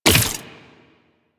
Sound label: Rifle Mech; DE; Warframe; Weapons; Sentient; Sent Rifle New War